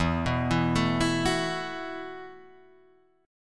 E Suspended 2nd Suspended 4th E, down, Guitar, Drop D